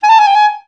G#4, orchestra; Best Service; Peter Siedlaczek's Advanced Orchestra

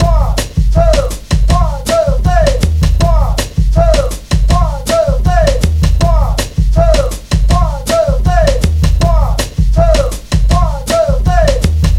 HEAVY METAL BOSSA 80 bpm; Planet of the Breaks

